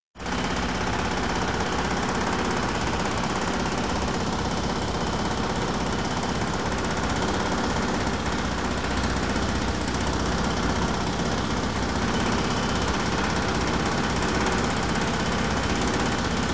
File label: Beeps; 헐리웃특수효과음; 신호-경적